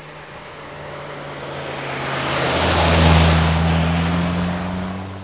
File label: Car driving by; transportation